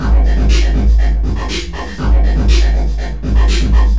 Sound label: ELECTR, Drums